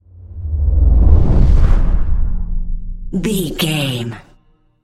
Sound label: cinematic deep bass pass whoosh